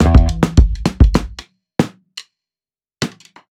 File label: drum fail; Fly O'Clock